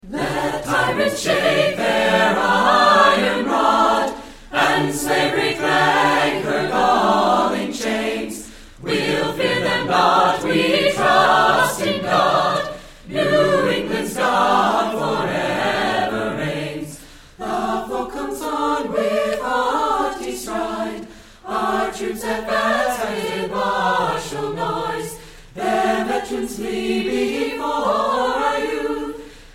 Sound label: Choir - Chester; 2003 Deering Spring Concert; (Billings)